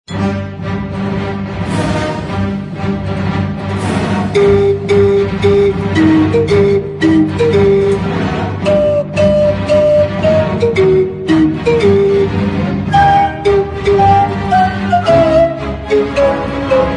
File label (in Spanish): bottle